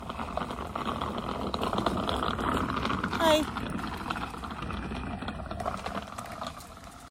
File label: caravan cassette trolley; oakdown holiday park